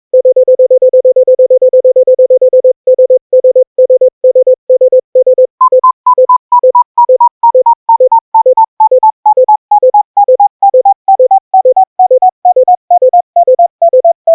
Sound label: gallop